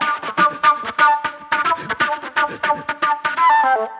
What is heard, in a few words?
Spit Plate Flute